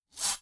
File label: nova Sound; hexhollow